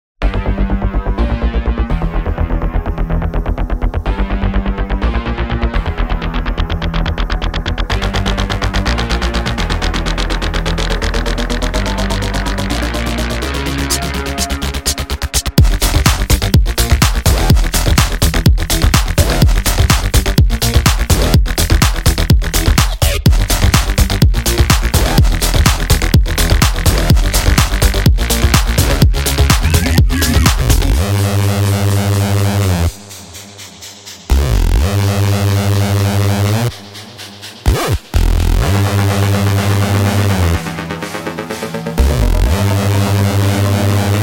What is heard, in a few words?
EDM; edm kinetic; Lektrique